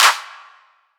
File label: Riddim Clap; One-Shots; Ghosthack; Riddim Essentials Volume 2